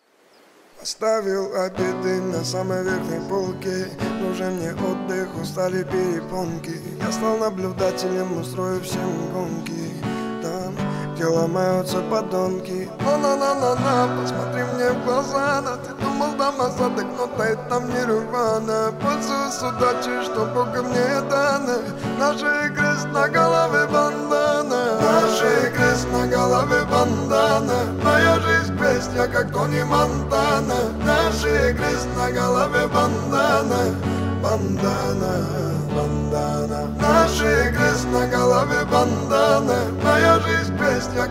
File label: acoustic version